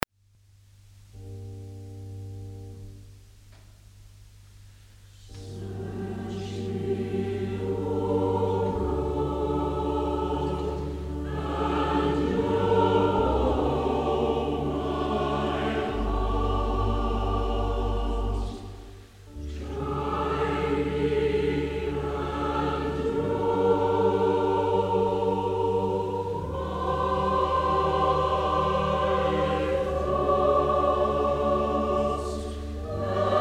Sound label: Choir, hymns